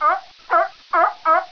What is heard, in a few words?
animal; Cards for Kids